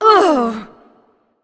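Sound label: ouch, female; smite; voicepack; hera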